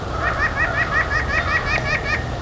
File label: nuthatch